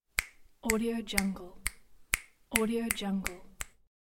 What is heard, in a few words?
snapping with fingers